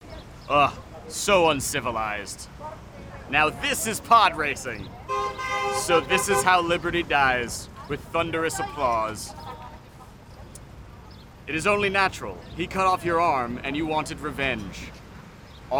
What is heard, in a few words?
Traffic Boom; CEDAR; cedar-demo